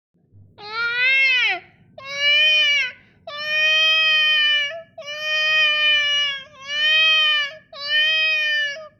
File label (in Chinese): 婴儿